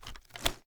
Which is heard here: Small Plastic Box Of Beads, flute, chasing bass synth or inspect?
inspect